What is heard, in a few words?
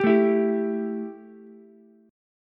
E Minor Double Flat 5th, Ukulele; Embb5 strummed; 12,9,12,10